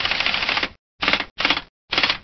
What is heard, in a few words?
Air Wrench